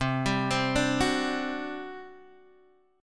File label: G Major 7th Suspended 4th, C